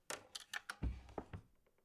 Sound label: Household Old Wooden Writing Cabinet Top Desk Folding Open; Drawer